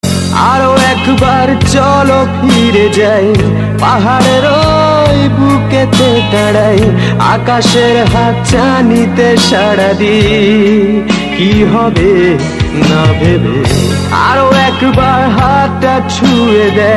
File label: Bangla Band